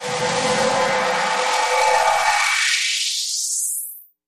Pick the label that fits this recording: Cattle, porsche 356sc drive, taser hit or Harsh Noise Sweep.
Harsh Noise Sweep